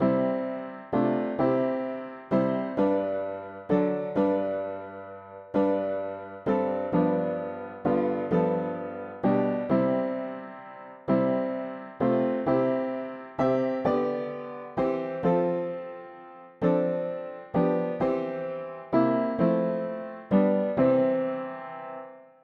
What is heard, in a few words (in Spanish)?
mono piano; hymns; Himnario pequeno